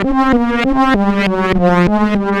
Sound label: Roland C Line, Roland S100; musicradar-synth-samples